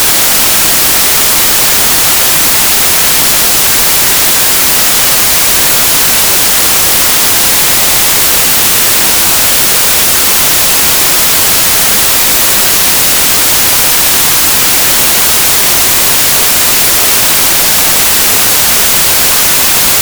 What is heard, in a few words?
white noise sound mono 44100Hz; wikipedia